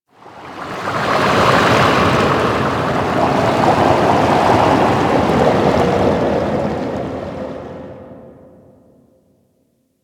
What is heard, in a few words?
Ocean Deep Sea Bubbles; Warframe; Grineer Ocean